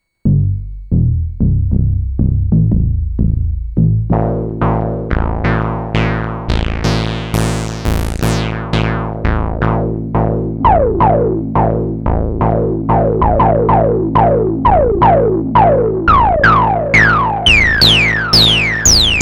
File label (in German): monologue sequenz